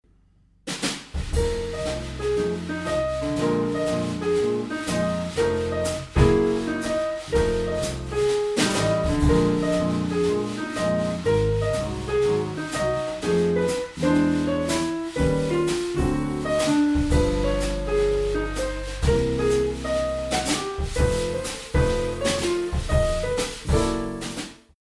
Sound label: blues; star songs